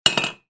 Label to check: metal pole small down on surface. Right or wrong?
Right